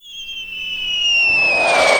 mortar incoming; Explosions; Call of Duty Modern Warfare

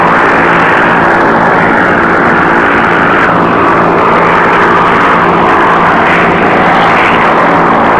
engine bomber rpm50 far; War Thunder

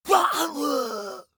scav death, voice; Escape from Tarkov; scav1

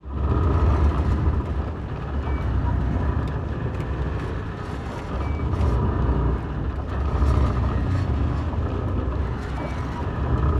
Wings Overload In, Damage; DCS

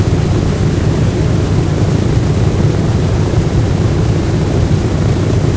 b17 side, engines; War Thunder

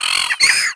seagull; ambient birds; World of Tanks Blitz

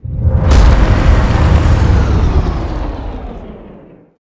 mohole emerge

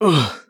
death; Escape from Tarkov

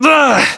pain, voiceovers; ModernWarfare; localized english

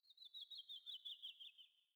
sting songbirds; Rust

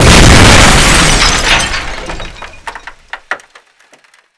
car close; Explosions; Call of Duty Modern Warfare

